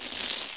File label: tumble ring stand